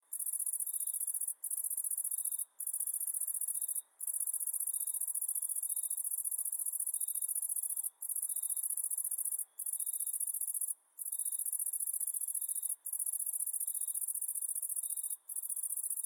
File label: cicadas night; ambient